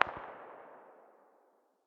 ak very far distance; tacz-tweak-pack; taczmechanics

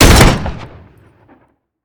taurus shoot; daffas arsenal; tacz

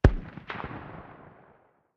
hmg m2 far distance, 12.7x99mm; tacz-tweak-pack; taczmechanics

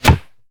wood, hit; tacz-tweak-pack; taczmechanics

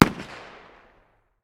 ar 556 medium distance, 5.56x45mm; tacz-tweak-pack; taczmechanics

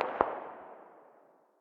dmr very far distance, 7.62x51mm; tacz-tweak-pack; taczmechanics

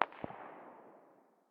ar very far distance, 5.56x45mm; tacz-tweak-pack; taczmechanics

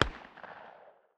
pistol 45 far distance, distant 45 acp; tacz-tweak-pack; taczmechanics

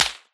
near, whizz; tacz-tweak-pack; taczmechanics

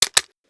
taurus speedloader; daffas arsenal; tacz

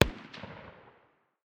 ar far distance, 5.56x45mm; tacz-tweak-pack; taczmechanics

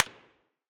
mid, whizz; tacz-tweak-pack; taczmechanics

